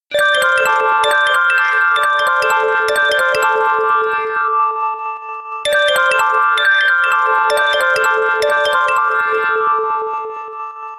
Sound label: mystic alarm